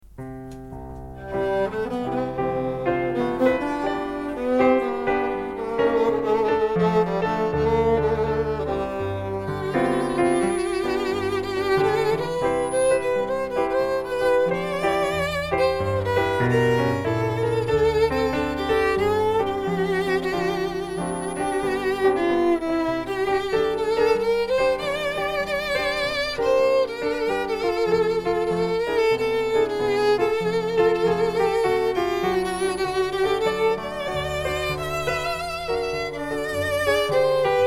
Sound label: Violin & Piano duo